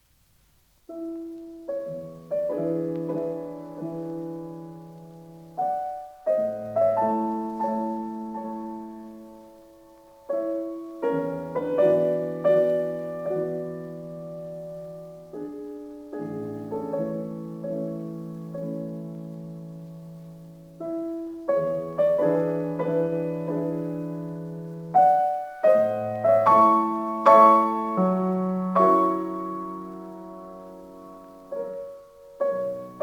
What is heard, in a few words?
Preludes; Chopin; Op28; Lympany